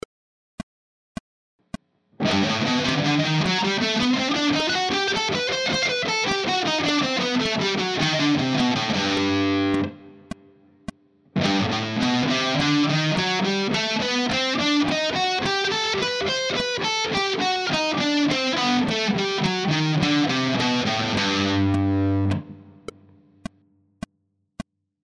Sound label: harmonic major